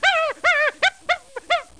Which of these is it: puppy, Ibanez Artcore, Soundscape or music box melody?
puppy